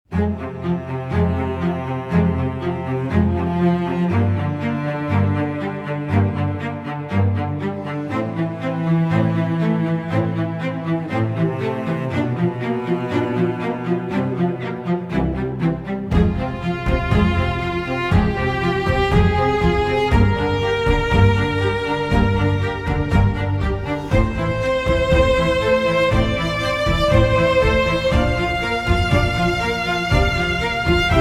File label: Strings